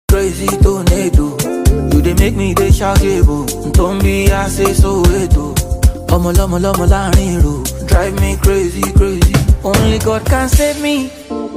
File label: open verse instrumental beat hook